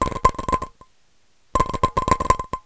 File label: ping